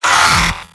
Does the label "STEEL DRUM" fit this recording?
No